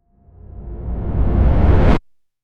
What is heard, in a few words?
dark trap ambient riser